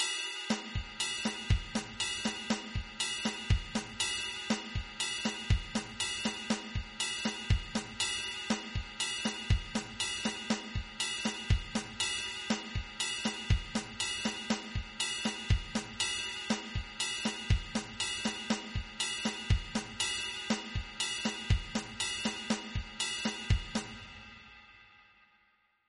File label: songo; Creating Rhythms